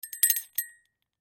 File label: Jingle Bell Up, Jingle Bell Pick Up Christmas; Holidays; Foley; Blastwave